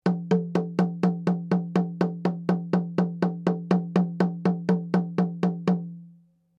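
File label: Drum Flat Skin mezzo forte Sequence, Drum heads isolated from drum shell; Musical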